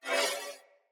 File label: Sword Whoosh Accent; Weapons; Player; Swords; Fey Sword